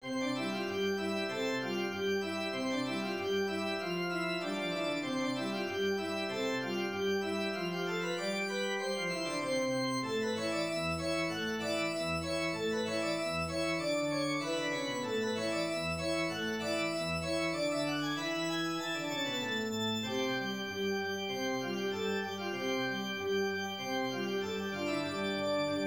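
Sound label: Galop organ; Christmas 2016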